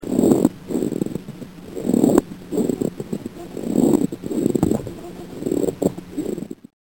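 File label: friction rub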